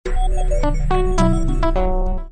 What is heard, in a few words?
Vivo S18 Notification Ringtone